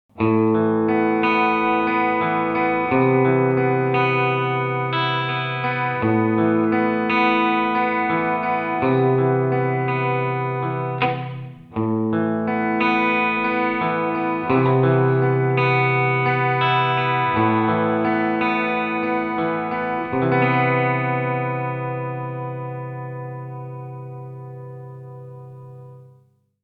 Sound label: Plate; Meris Mercury X